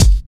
raw bass drum sound g key, kick sound tuned to the G note